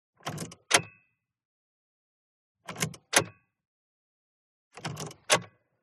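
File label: SPORTS CAR Interior Key Pull Out Short; TRANSPORTATION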